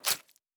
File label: Stab; Fantasy Sounds Bundle; Medieval Combat Sounds